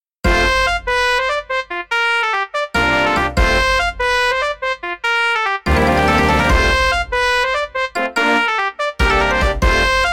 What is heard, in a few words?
fanfare, brass ensemble